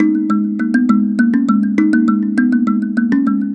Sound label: Mbira Low Echo; Melodic; Ambient-Melodics-II